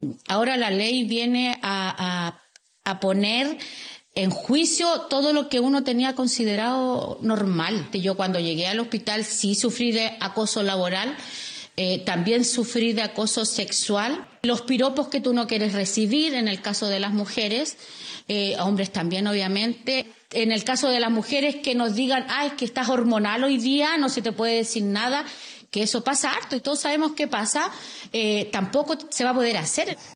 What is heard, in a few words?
vocals